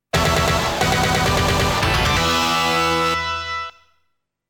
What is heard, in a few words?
Stage Clear